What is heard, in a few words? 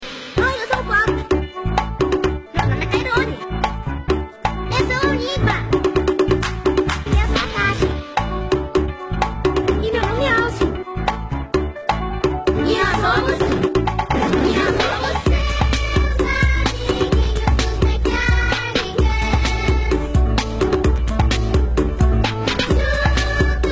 Funk Version; Backyardigans